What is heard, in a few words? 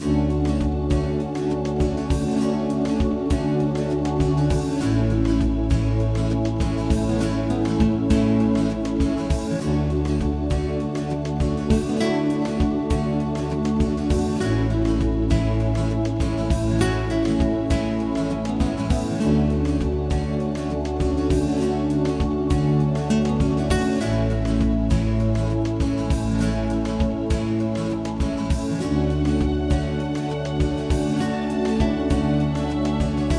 Frontend Horse; barbie horse adventures mystery ride